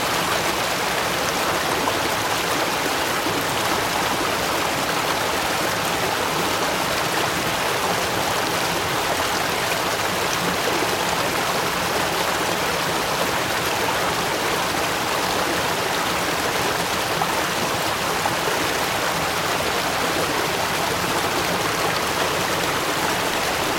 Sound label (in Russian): brizgi grohochushego vodopada